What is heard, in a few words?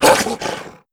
SFX hound attack